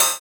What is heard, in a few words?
Closed Hat, JUNGLE BREAKS